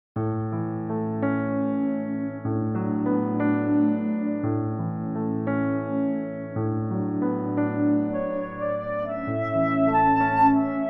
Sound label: cover flute